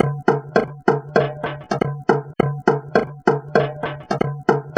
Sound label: Flanged Off Beat; Electronica; Solo Beats